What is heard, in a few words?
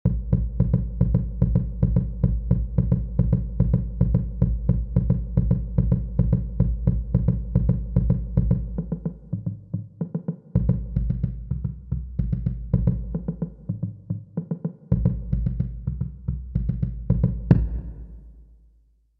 bass Drums, drumline